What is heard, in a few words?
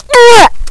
fall; bong